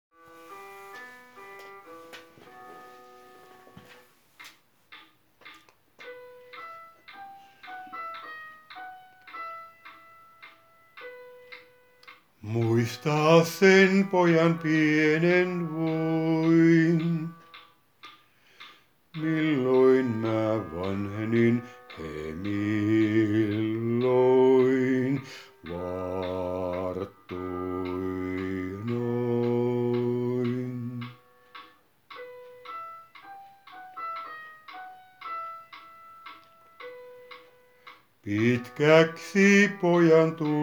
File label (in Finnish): Nousee päivä. basso